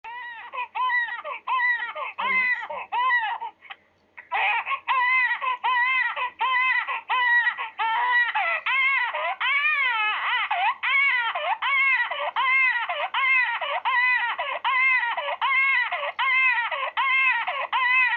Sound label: crying